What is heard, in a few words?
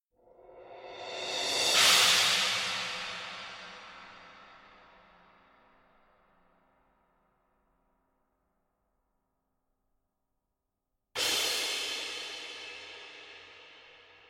cymbals